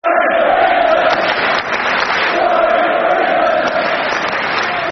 Football Chants; Shorey For England; Reading Rfc

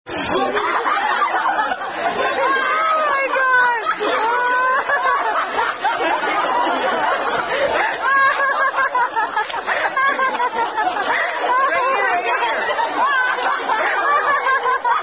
Circle Game With Gestures; Boom Chicka Boom Boom

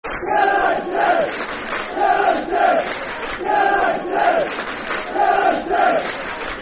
United! Clap Football Chant Soccer Songs; Dundee United Dufc

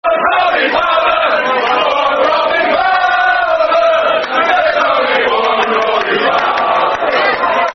Football Chants (Soccer Songs), Liverpool; One Robbie Fowler; Liverpool Lfc

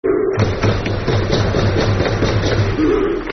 Hoops!, Football Chants; Queens Park Rangers Qpr

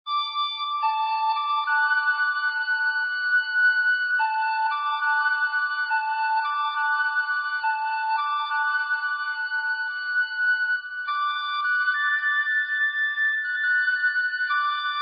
Taps, Karaoke Songs; Girl Scouts

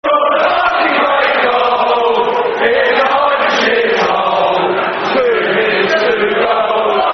Football Chants; Oh Wayne Bridge; Chelsea Cfc

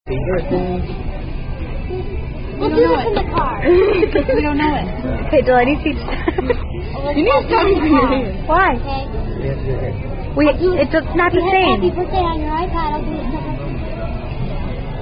Singing Happy Birthday, Happy Happy Birthday; At Applebees

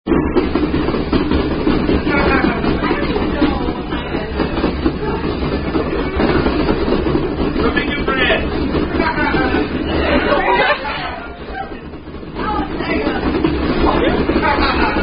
Thumper, Signs Indian Drinking Game

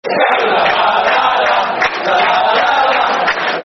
Let's All Have A Disco, Football Chant; West Ham WHFC

